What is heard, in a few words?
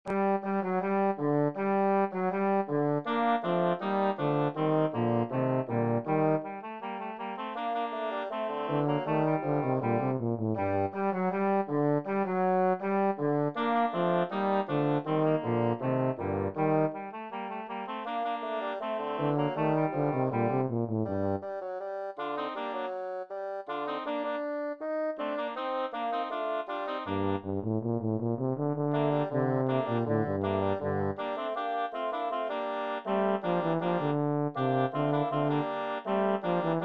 Bass